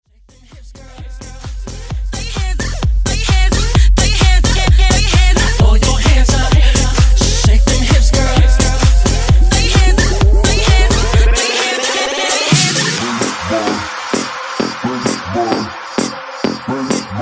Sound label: Melbourne Bounce Hype